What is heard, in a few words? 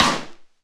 Rimshot, Dubstep One-Shot Drums; Rimshots & Toms